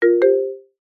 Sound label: multimedia game tone marimba event action